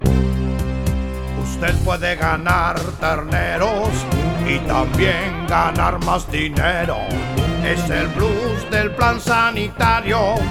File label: jingle; caprove